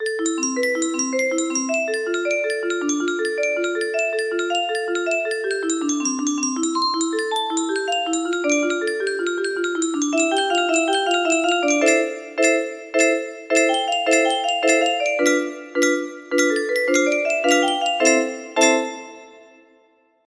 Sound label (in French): boite a musique